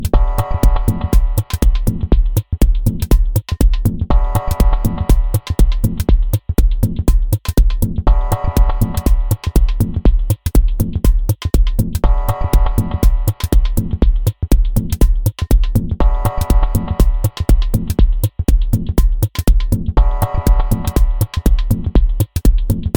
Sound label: dub; netpd